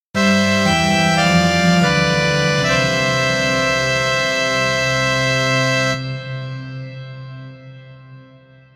gameover

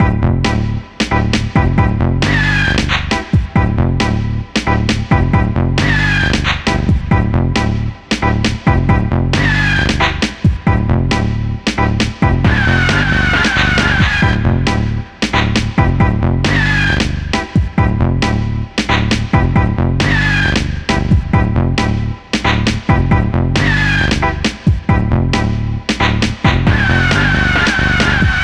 hiphop bass

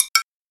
stumble

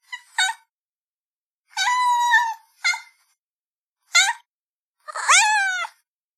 strange cat voice

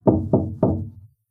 3 knock

